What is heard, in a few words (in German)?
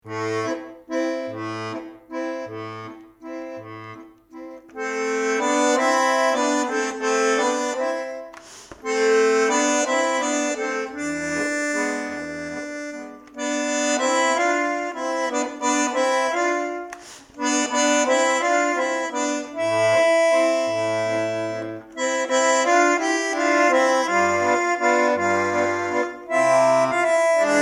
walzer fuer zwei, bandoneon